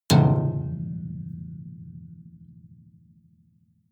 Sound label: metal impact piano string damped hard